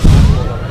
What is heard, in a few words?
boom